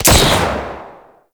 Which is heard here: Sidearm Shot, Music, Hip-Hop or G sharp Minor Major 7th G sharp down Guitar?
Sidearm Shot